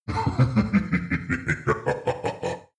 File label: demon dark voice thick laugh; Creatures; kreaturen